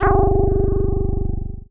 SPACE DOG G0, dubstep; Loopmasters; Jack Sparrow; Deep Dubstep Progressions

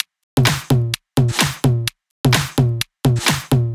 Loop, 128 BPM

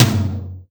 Toms; Mutekki Media; Vengeance Electroshock Vol.2; VES2 Percussion

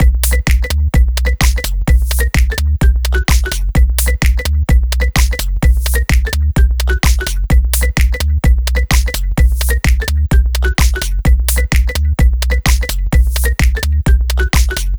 Minimal Kit Mix; Mutekki Media; Vengeance Electroshock Vol.2

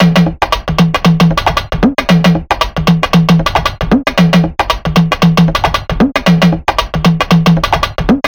115 Breaks; Get Wicked

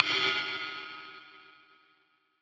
Ride, Dubstep One-Shot Drums

